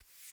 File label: Household Broom Sweeping Cement Floor